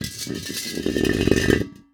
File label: Impact Tank Propane Tank Dragged On Cement Floor; Impacts